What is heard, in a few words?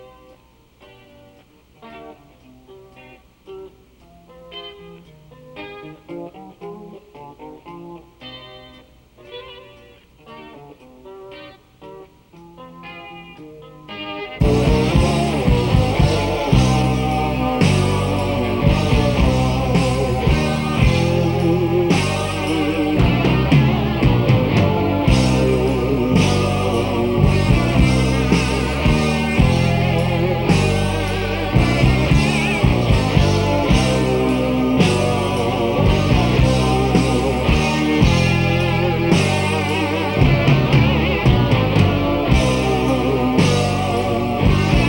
Instrumental; Original Instrumental Demo Session